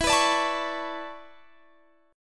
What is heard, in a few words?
E 13th Suspended 4th